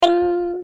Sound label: ding; vex3